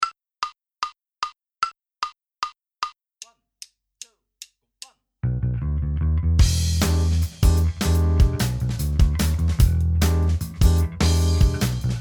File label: Minus Guitar; Inst Ensemble